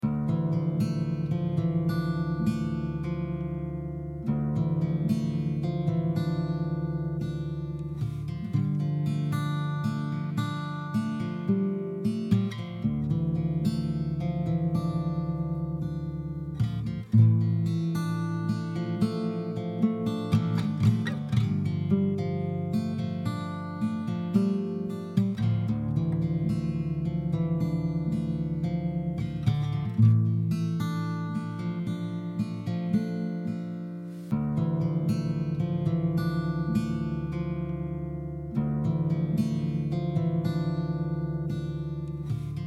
Dm112 Spooky Blues

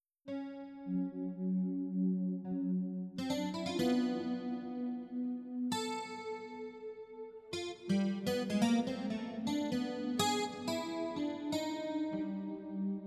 guitar Steel Pad

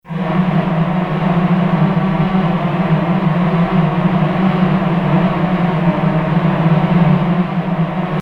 Animated strings

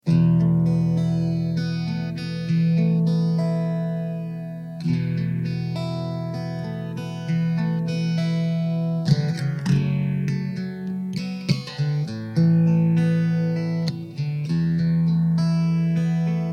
E 100 Acoustic GTR arp

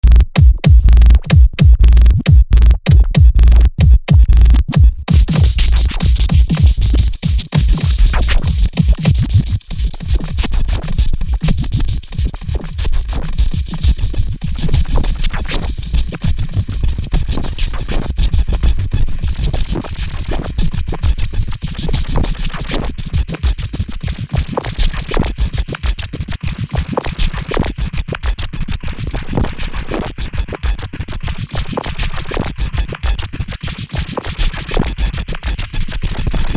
noise undrum; softmartin

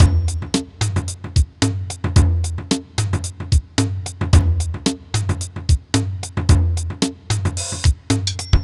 Drumloops; MixterPlus

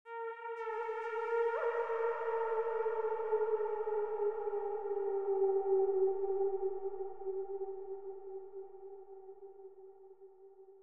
Synth Wolf Howl; Zenboy1955

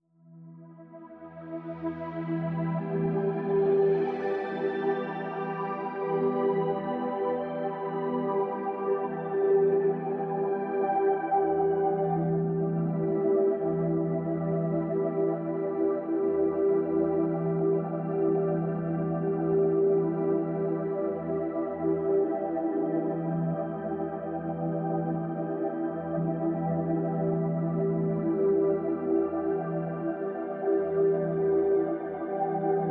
pad

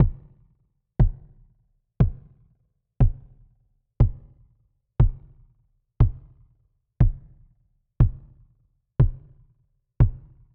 Kick

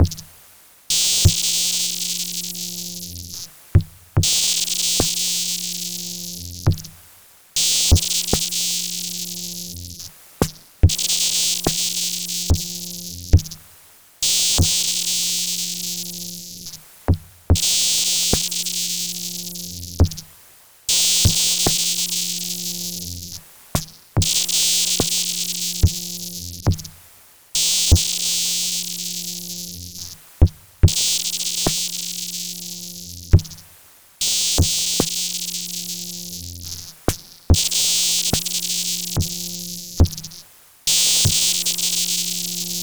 Cm72 Zone Ambience

